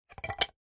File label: foley wood strip small thin drop or set down hard surface; zapsplat